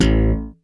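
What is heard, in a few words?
WARWICK THUMP A1, BASS; Masterbits CLIMAX 7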